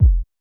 Modern kick, Electro

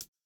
Jrock hihat

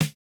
no effect j city pop 1980 snare

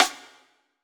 hiphop snare, Effect on

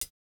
hihat, house

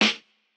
Jazz hop snare; Hiphop

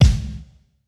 Rock kick; Reference Beat

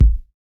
chillhouse kick; Electro

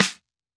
Hiphop snare; Dry original

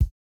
no effect j city pop 1980 kick

